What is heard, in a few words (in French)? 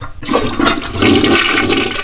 Chasse D eau